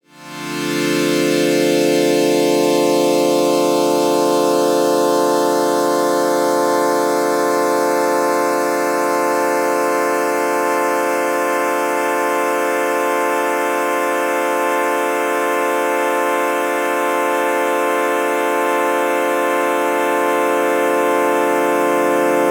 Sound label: Boss DE 200 Digital Delay Sync Juno 6 Overdubbing